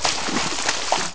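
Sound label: step deep water; Pirates Of The Caribbean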